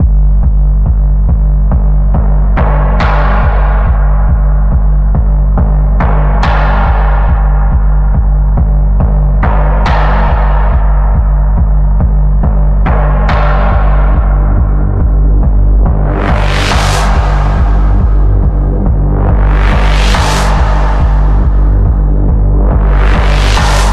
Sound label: Deep Pulse Drive, G